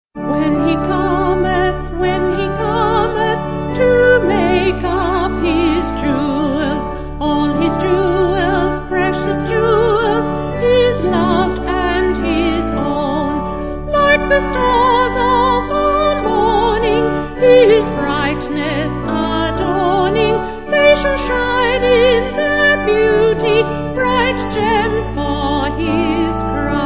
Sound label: When He Cometh Vocal Organ